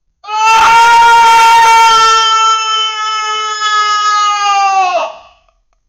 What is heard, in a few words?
man screaming in anger